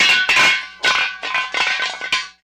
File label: Hubcap On Asphalt